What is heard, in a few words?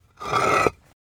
Sfx Stone Scrap Massive